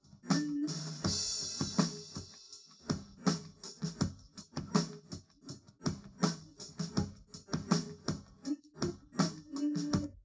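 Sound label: with drums, 130ms, 20cm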